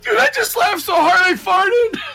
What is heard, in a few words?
laughed